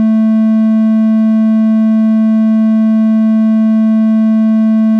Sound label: 220 Hz anti-aliased triangle wave